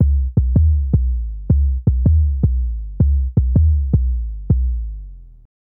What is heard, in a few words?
Stone Bass, Drum & Bass; Fluid Dynamics